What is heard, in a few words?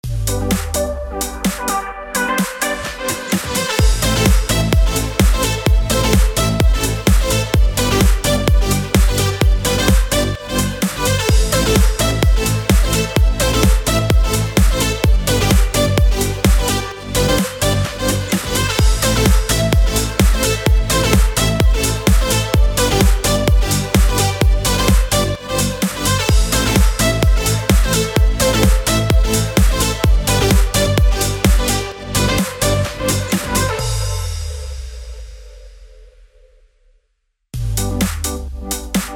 BED POP ELECTRO AMIN 128BPM